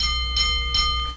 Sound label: Trolley; iLinux